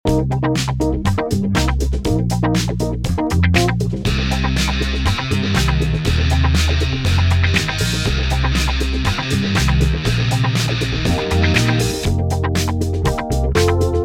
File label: Funky 70s